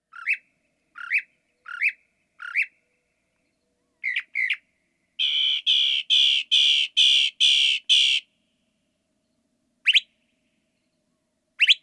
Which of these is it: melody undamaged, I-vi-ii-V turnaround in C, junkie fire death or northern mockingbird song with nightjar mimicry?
northern mockingbird song with nightjar mimicry